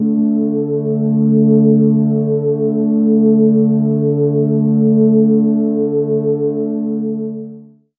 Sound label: pad a3